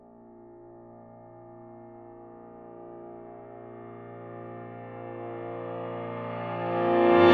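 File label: Piano In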